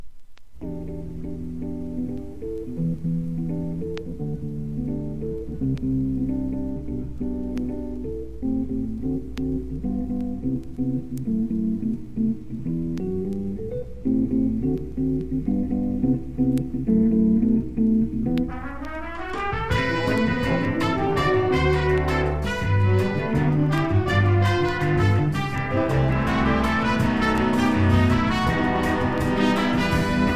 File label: berimbau; east meadow